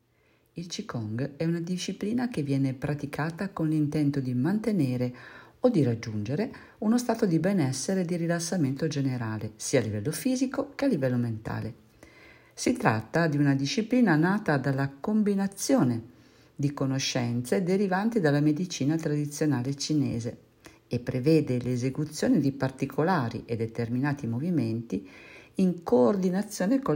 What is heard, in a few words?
qi gong